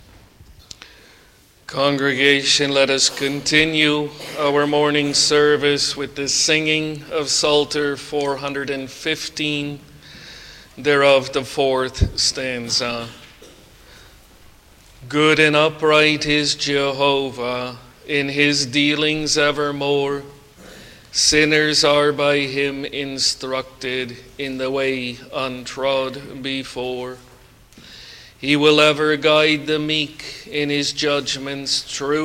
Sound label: Singing; The Lord's Incomprehensible Goodness